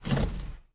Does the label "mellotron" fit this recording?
No